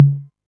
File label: 808 Tom Mid